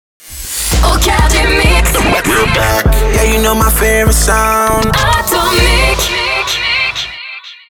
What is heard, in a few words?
We're Back 099bpm Rhythmic; ATOMIC; HABILLAGE ATOMIC